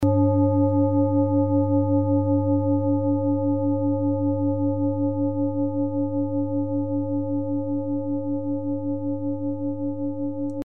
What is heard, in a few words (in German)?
assam planetentonschale